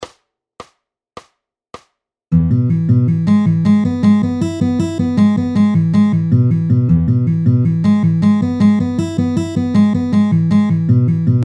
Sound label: rolls lick